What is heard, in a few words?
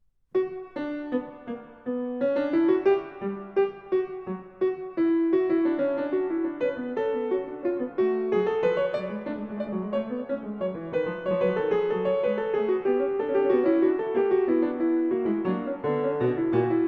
Fugue in h-moll, Keyboard; Bach; The Well-Tempered Clavier - Book 2; Fugue No.24; BWV 893